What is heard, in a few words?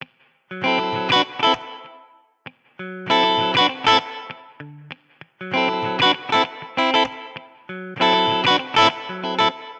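Guitar R&B D major 98bpm; Guitar and Bass; Ableton; Factory Packs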